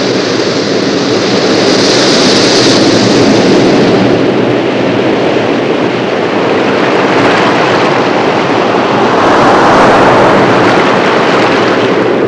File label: tide coming in; Nature